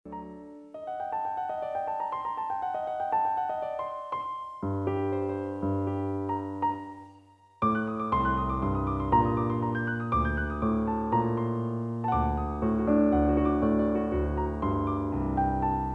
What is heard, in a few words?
Piano